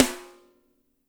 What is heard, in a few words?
SNR; Spectrasonics; Burning Grooves